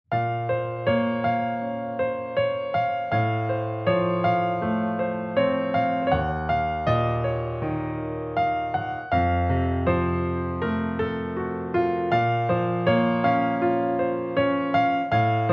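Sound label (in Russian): пианино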